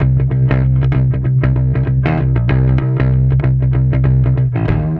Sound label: Bass